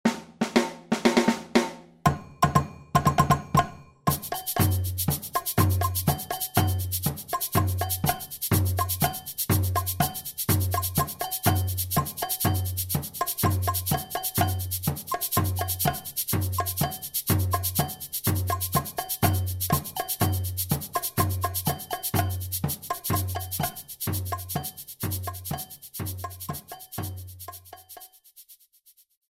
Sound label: batucada